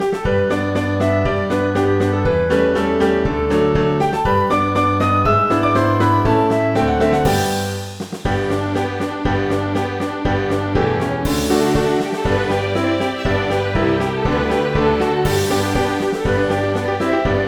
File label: O Susanna, 바이올린; Stephen Foster; 오 수재너